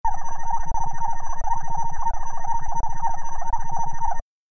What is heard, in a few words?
Bleeps Rapid Cyclin